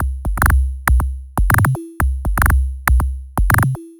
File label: Rolling Bubbles, Beats; Sonic Foundry (Sony Creative Software)